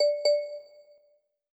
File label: incoming bg, Viber Sound Messages